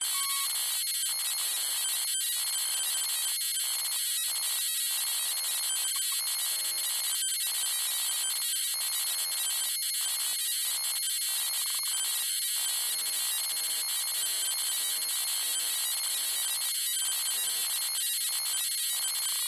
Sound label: science fiction data stream telemetry high pitched loop; Skyclad Sound